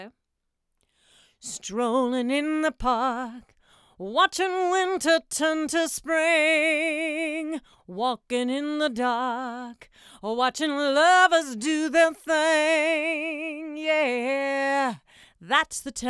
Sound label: Voc No Comp